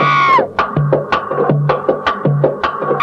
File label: BooGie Bonga; Movie Bits